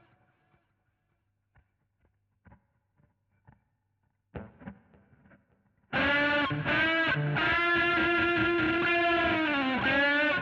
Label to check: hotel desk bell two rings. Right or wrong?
Wrong